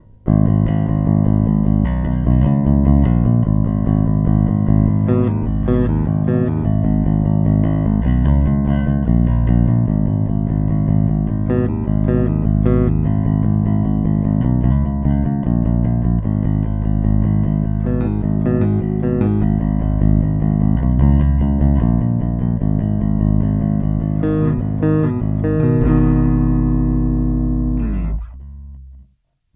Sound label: Both boost pick